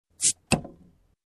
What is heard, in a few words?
Opening a bottle